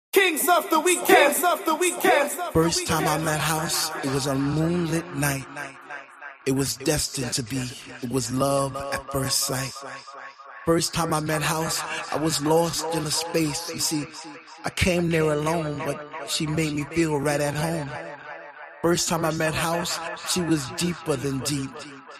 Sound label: House Mix